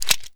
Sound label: 1911 magin; weapons